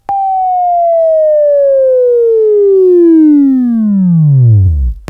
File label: Buzz, Sweeping Training